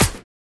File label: clap